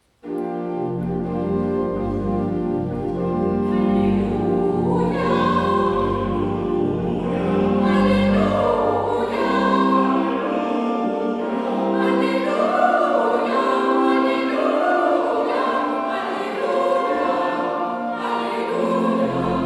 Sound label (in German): Halleluja, kirchenchor; Oberpleiser Propsteikirche